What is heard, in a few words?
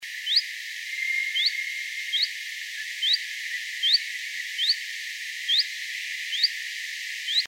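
Phylloscopus collybita; 2.10.2003 Helsinki, Finland; Linnut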